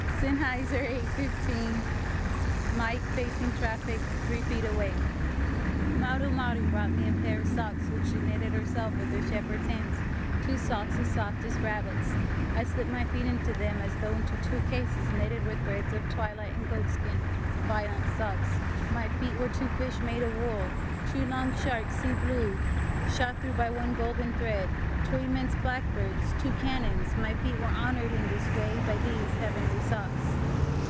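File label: Senn 815 3ft Mic Facing Traffic, Super Cardiod, Exterior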